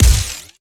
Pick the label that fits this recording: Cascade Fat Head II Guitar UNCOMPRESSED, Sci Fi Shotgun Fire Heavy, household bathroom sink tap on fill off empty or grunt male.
Sci Fi Shotgun Fire Heavy